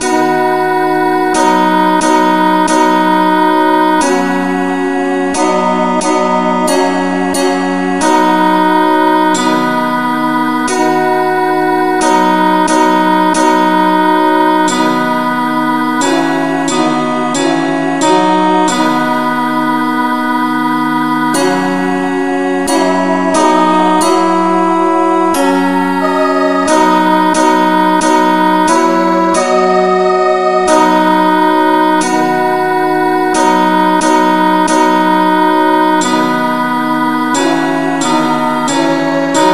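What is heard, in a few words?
henley no vocal keyboard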